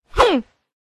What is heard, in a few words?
mage female attack voice middle